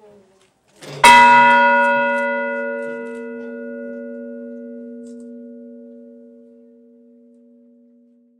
BB39 bell

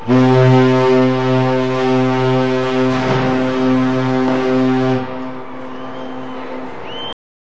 cvn77 whistle; Surface Ship - Ship's whistle - USS George H.W. Bush (CVN-77). Five-second blast of ship's whistle

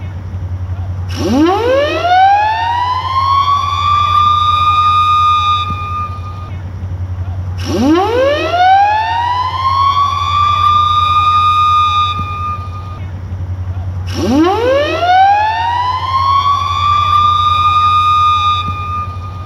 steam siren; Surface ship - Emergency Alarm - USS Kidd (ex-DD661) - Steam Siren sound - mechanical/steam. Recorded March, 2018

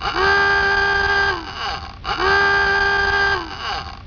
H8 horn

